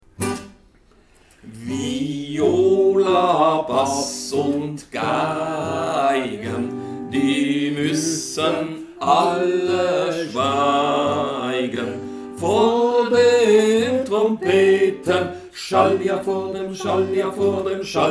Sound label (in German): Viola Bass und Geigen